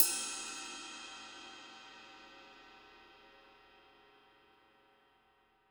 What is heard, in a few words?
DW Green Maple Ride Cymbal; 1999 DW Green Maple Kit; One-Shots; Sonic Foundry (Sony Creative Software); Vital Drums